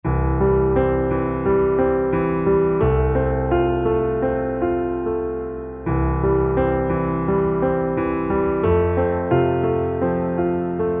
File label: Underscore PIANO; Worship Flow